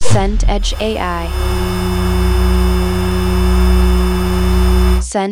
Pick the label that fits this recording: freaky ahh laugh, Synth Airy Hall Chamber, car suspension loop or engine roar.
engine roar